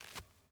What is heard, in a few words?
dirt jump; teardown; ps5 windows xbox series xs